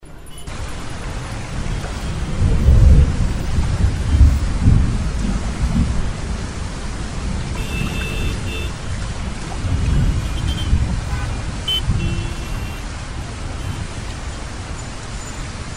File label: rain, mumbai